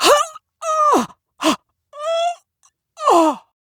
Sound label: Death; Warframe; Corpus Lich; Persona B